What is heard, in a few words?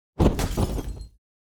riot begin; Shelter